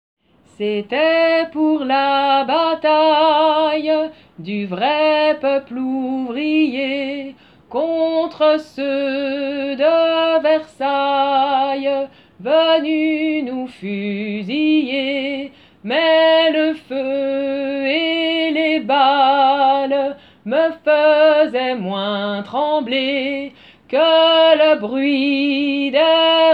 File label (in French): voix basse; J'attends devant ma porte